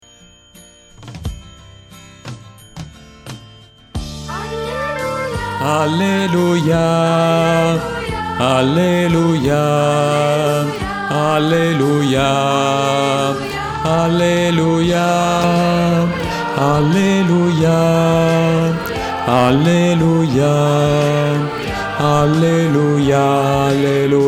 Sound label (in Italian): alleluia ed oggi ancora, contralti